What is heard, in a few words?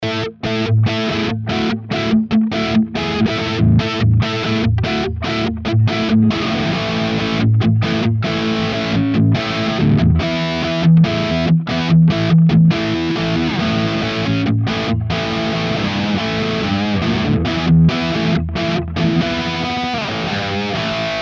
Epiphone Highgain Rhythm